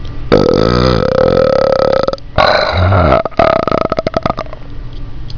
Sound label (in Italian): Rilassamento, Rutti